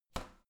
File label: garbage container light lid shut soft